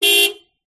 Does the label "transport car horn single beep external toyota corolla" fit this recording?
Yes